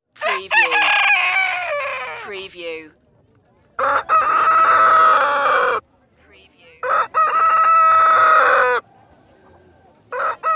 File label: cockcrows